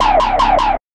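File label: Alert Space; FREE SFX PACK VOL 1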